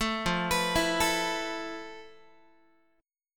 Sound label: F Major Sharp 11th, Guitar; FM#11; 12,13,14,14,13,x; 4A,4E,5A,5D,5G#,6C#; arpeggiated